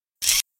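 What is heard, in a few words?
Mechanism Fast Metal, servos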